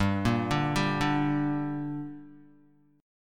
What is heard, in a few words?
G Minor G down Guitar, chord; arpeggiated; JGuitar